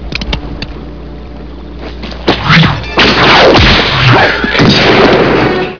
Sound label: whip